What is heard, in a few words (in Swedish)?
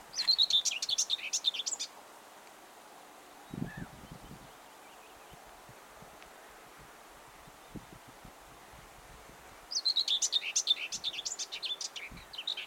törnsångare; fågelljud